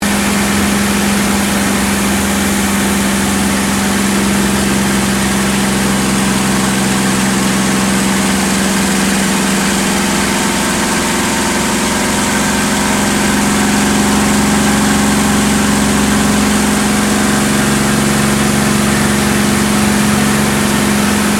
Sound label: AIRCRAFT CAC CA-18 MUSTANG MK23 FLYING INTERIOR; CA-18 /P-51 Mustang; Airplanes; Vehicles; Aircraft Collection; Twistedtracks